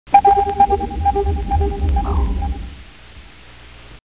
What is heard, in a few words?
ping